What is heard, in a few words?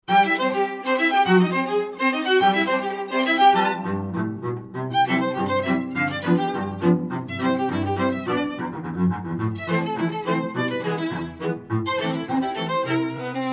String Quartets; cheeky string quartets